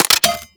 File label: Laser Aim Rifle Mech; Warframe; Grineer